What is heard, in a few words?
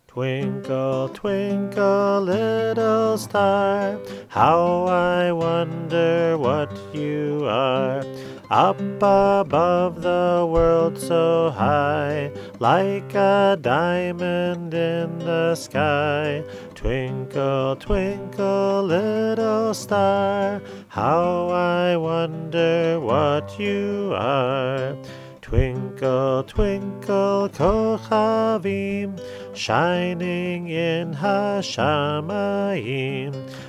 Twinkle Kochavim Eng Heb